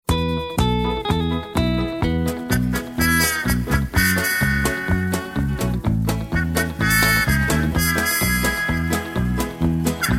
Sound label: music